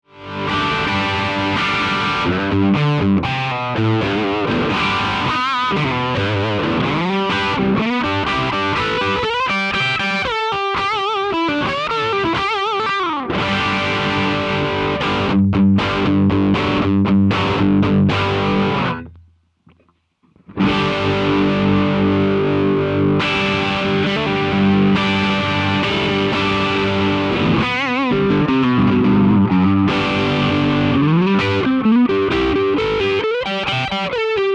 SH-18 drive

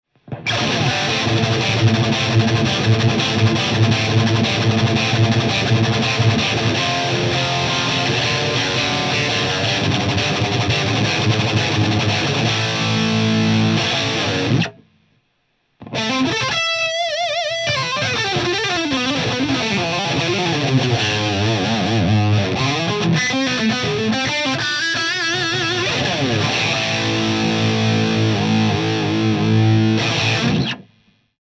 tone marshall heavy

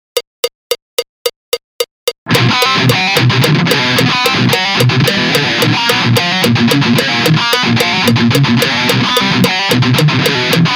thrash

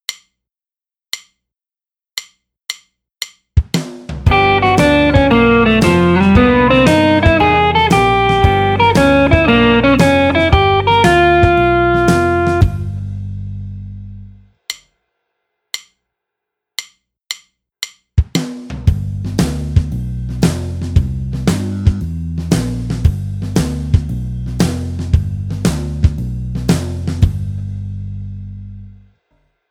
Build Up

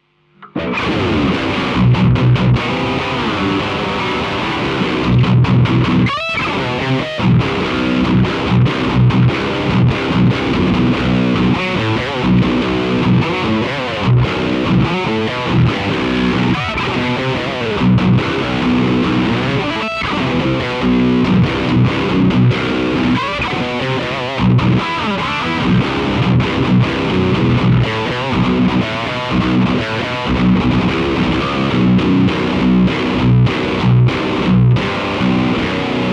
drive; Pegasus 7

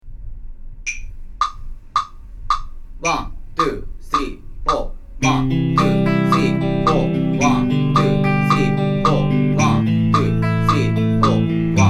vocal

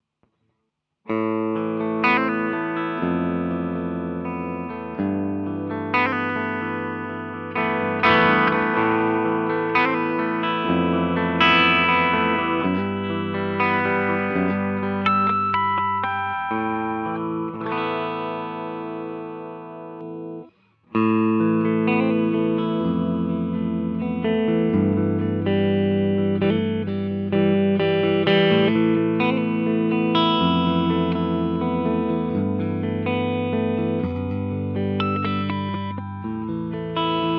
Alnico II Pro Slash clean

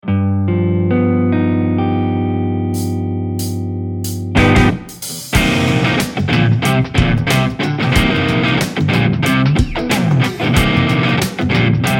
GIBSON P 90 Single Coils

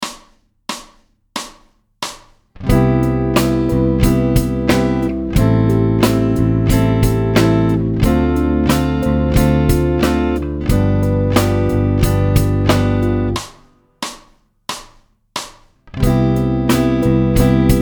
chord

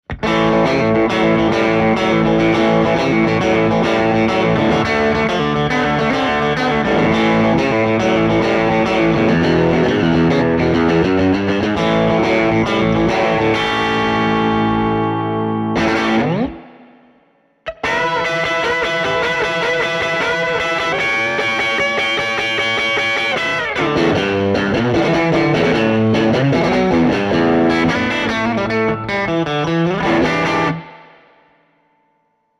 tone fender rock

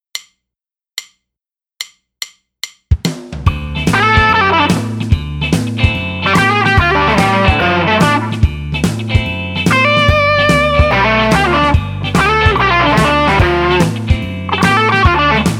Build Up

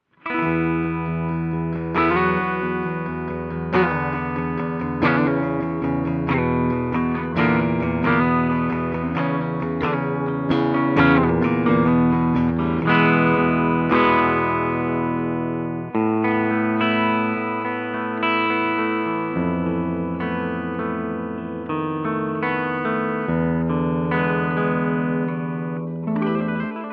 clean; SH11 Custom Custom

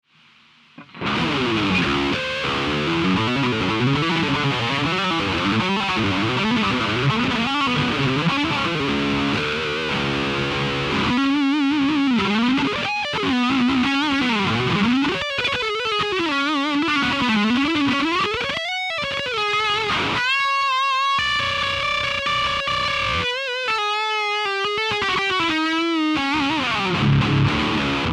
solo

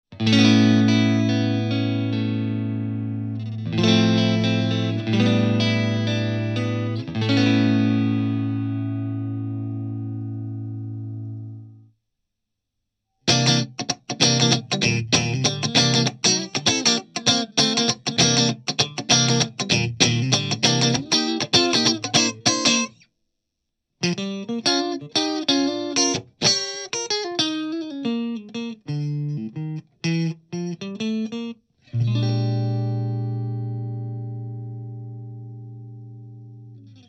tone marshall funk

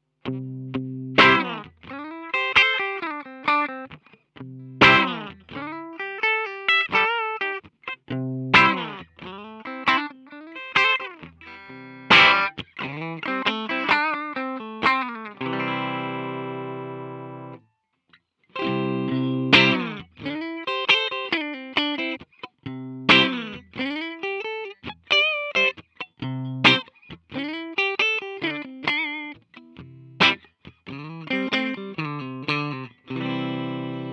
phat cat lp clean

